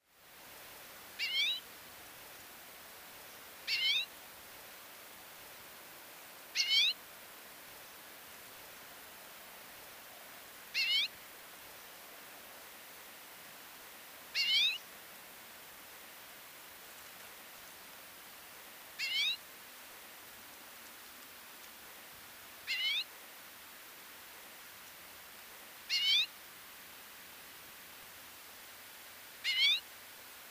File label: Common Redpoll, Acanthis flammea; finches